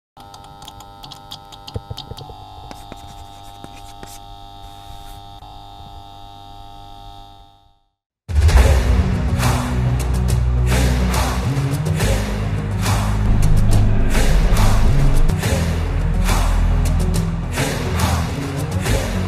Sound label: Cricket Anthem